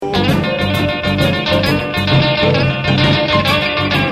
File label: guitar break